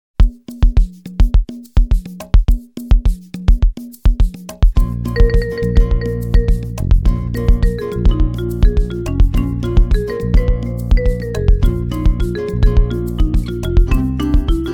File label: Brazilian Jazz Samba; Soundzabound Volume 2 Disc 3